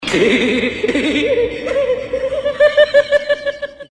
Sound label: LAUGH